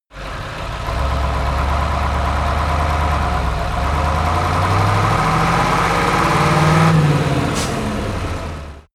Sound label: truck ural 4320 start driving accelerate